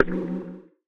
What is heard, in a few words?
cast; starovous